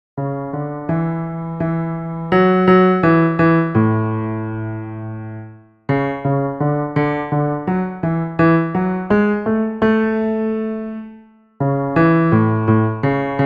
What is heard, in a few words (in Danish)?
bas